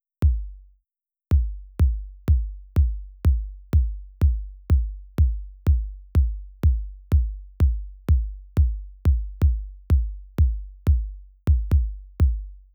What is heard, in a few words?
drums kick